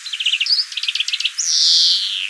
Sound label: greenfin